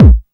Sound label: BD HUMP; drum; BASSDRUMS